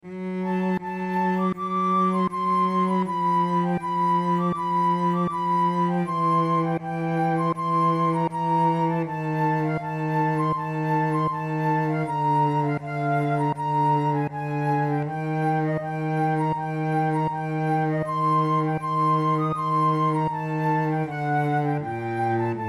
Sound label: adagio, flute